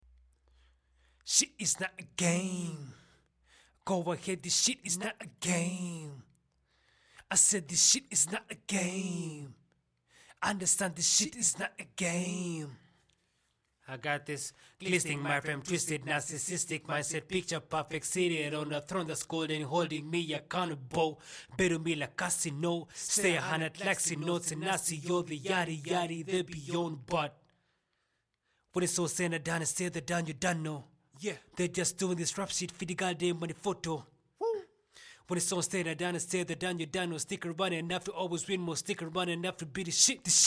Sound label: HH, Acapella, 147, CM